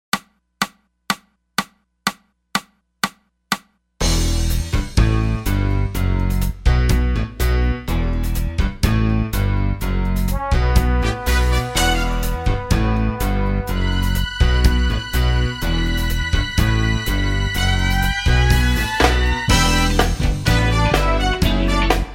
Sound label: Electric Bass; music education; Grade10